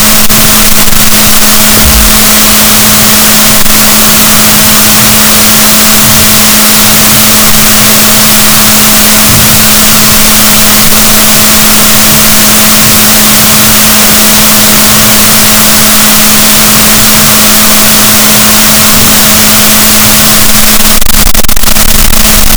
rorquals negatif, gaussian noise, BalaenopteraPhysalus Chile